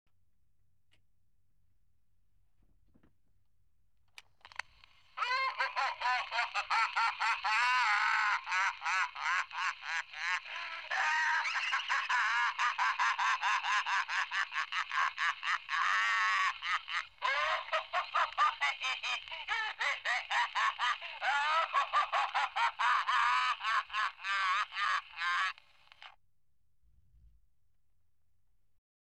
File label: Laughing SFX